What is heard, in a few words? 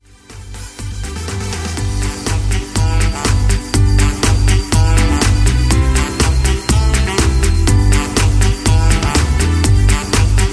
Streamin Instrumental